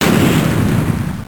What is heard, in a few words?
explode; Super Sonic Knockout